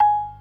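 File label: PERC C sharp 3; Best Service; Advanced Media Trax Modern Composer; B3 FULL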